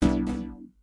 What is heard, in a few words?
Button Click; Custom UI Sounds; SEGA Dreamcast